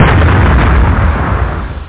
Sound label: EXPLOSIO; 2 seconds; Scream Tracker Sample